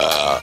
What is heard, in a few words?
burp short